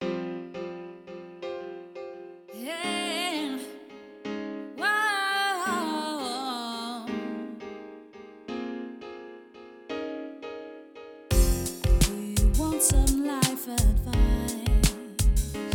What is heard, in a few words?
vocal chill 432 hz